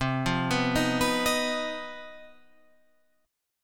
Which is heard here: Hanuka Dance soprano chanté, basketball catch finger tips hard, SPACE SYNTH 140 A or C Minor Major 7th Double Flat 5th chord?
C Minor Major 7th Double Flat 5th chord